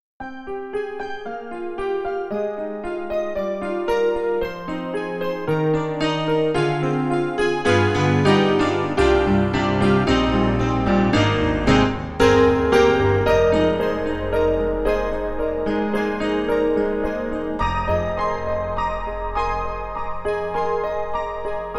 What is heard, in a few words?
KORG KRONOS 2 Piano